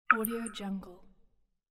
water drop

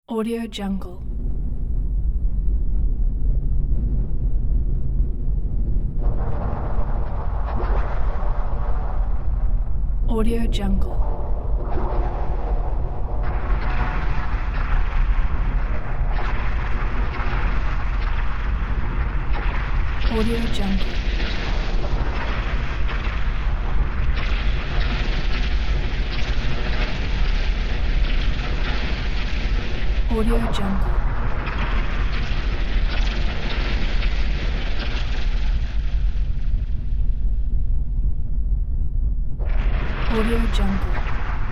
low rumble metal wobble

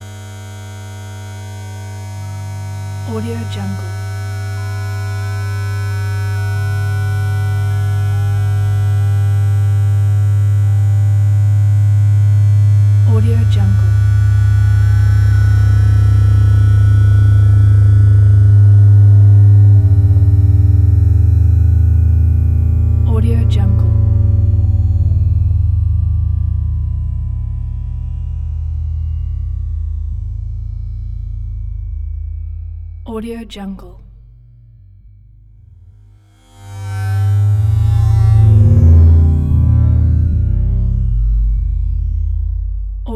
force field thick synth buzz

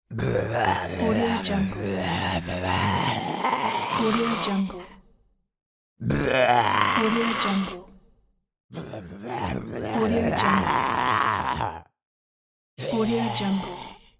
zombie

